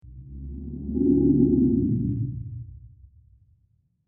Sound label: Deep Groan Sf Monster; Monster Sound Effects; science fiction sound effect